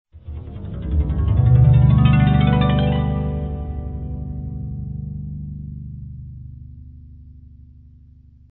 harp

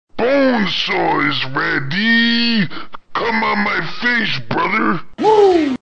bonesaw; reversevape

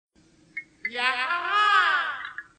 yahaha

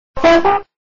toot

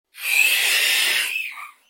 augh

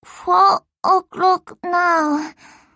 clock

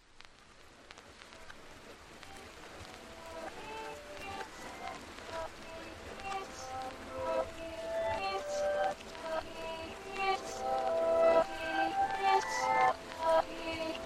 lofi uh oh; reverse

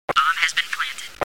bomb plant

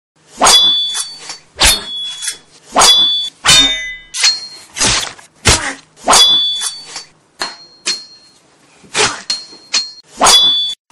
sword

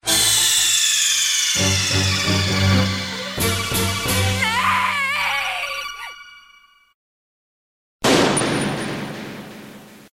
game over

